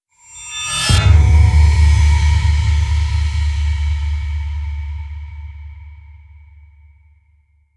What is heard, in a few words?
stinger